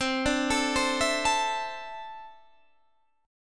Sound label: D 7th Suspended 2nd, C